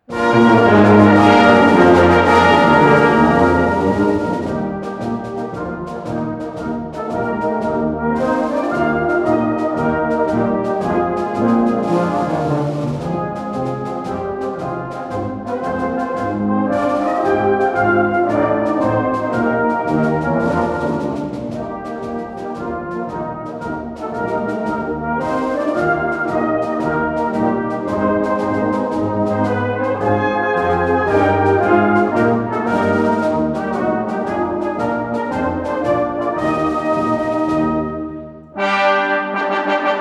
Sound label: march